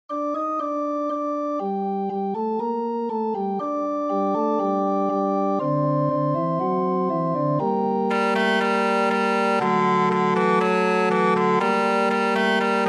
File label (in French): Basse; Louis-Nicolas Clérambault